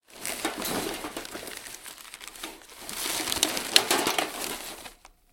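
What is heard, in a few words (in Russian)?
шелест от перебирания кучи бумаг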